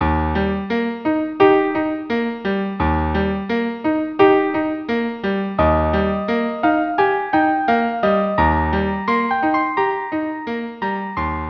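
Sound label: intermezzo carmen, violins, cello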